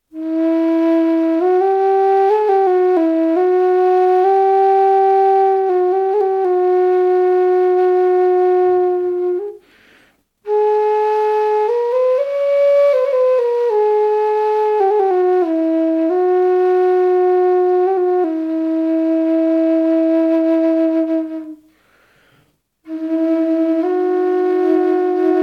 Aeolian E 432 ohne, drone flute aeolian tuning; e 432hz; nussbaum

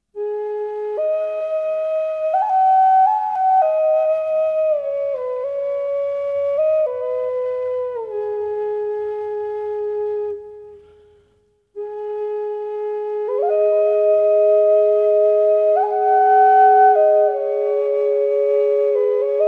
A432 mit delay, drone flute indianerfloete doppelfloete; olivenholz; a 432hz

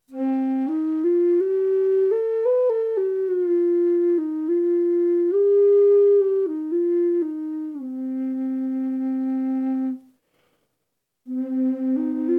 C 440 Bass ohne, drone flute bass; bass c 432; zeder

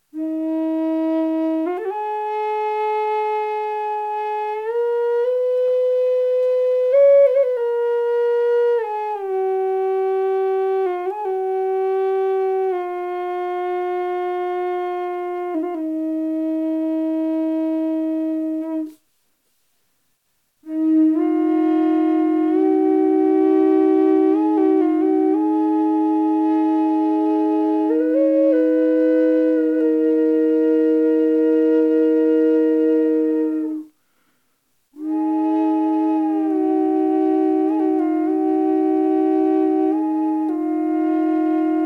Aeolian E 432 ohne, drone flute aeolian 7 loch; nussbaum pappel; 432hz

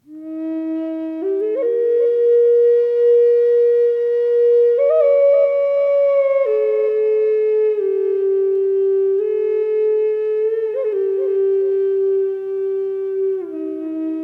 Native Drone Flute Indianerfloete Doppelfloete E440 hall; aromatic cedar

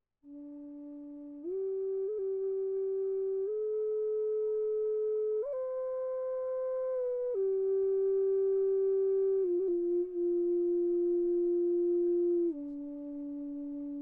D 440 Ahorn ohne hall, drone flute indianerfloete doppelfloete; d 440hz

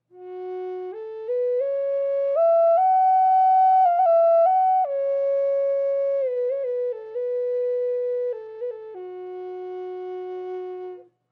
Triple Fis ohne, drone flute; nussbaum

